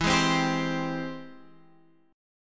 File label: G sharp Augmented Major 7th